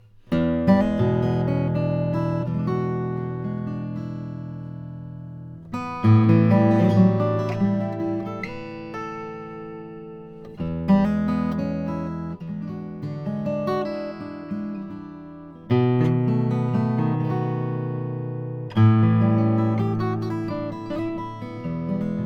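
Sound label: martin custom shop 000 28 brazilian rosewood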